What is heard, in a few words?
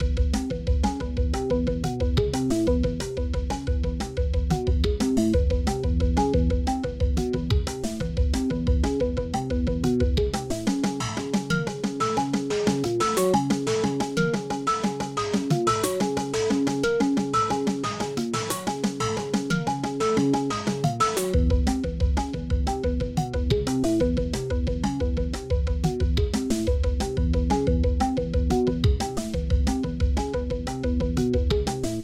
Hammond Organ Loop